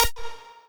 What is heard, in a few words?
lead